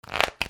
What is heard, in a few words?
CASINO